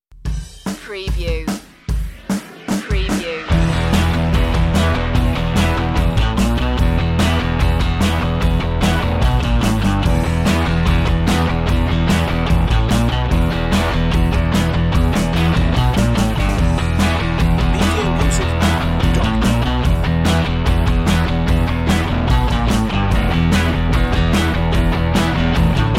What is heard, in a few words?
wheels underscore